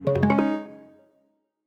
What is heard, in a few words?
Streaming Start; Medal